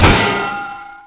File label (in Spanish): golpe maza; Severance